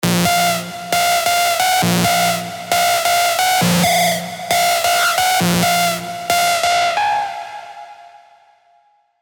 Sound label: Abnormal Signal, big room techno drops; revealed serum 2 big room techno drops vol 4